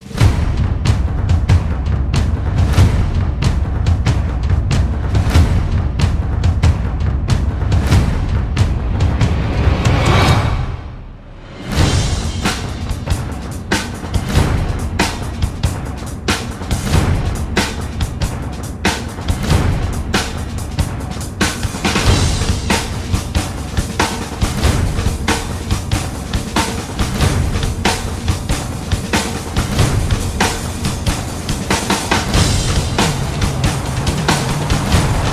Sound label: Drums Only Version